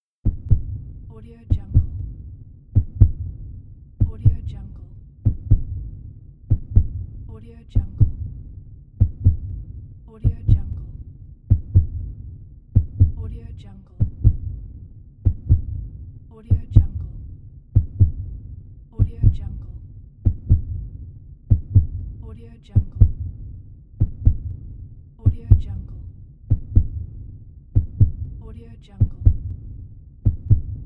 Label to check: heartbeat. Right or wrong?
Right